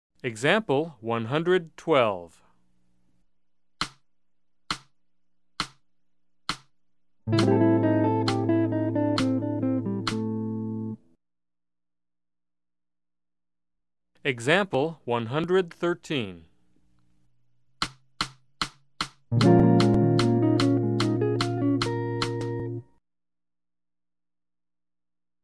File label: Jazz